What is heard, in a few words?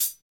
HH HH, HH Real